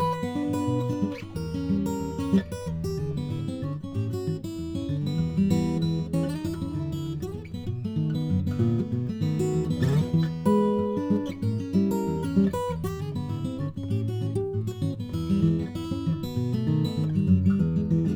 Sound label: Model J Brazilian; Gene Bush